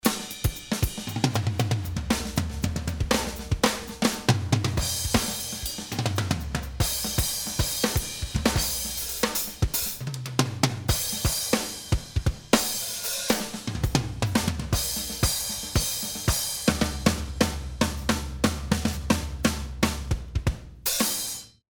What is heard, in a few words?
DRUMS